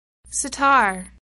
sitar

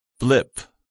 blip

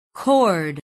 chord

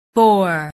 boar

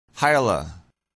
hyla

